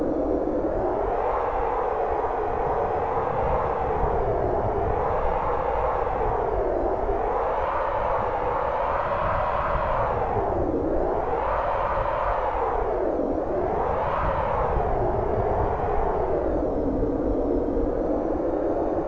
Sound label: zelda windy; quake2; kitsune